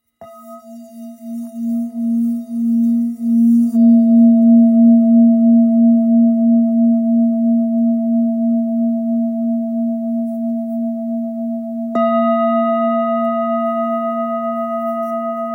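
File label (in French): Bol ancien la, bols chantants tibétains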